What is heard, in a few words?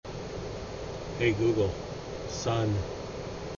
light on